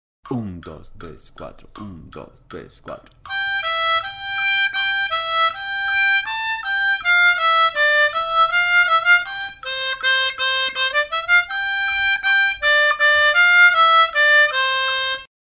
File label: This Old Man